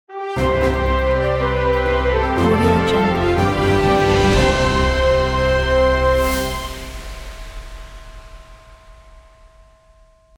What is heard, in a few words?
orchestral logo; cinemagicsound